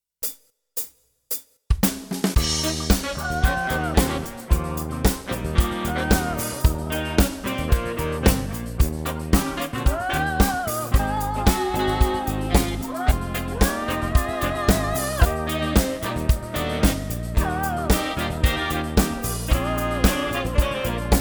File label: soulband en vocal